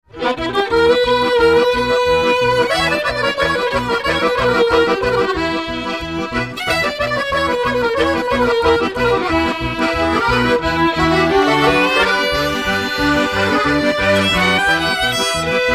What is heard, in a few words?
polka